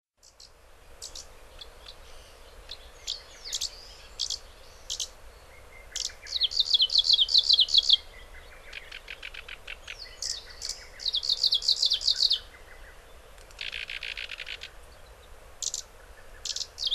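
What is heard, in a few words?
Japanese leaf warbler, hyogo; mebosomushikui; koe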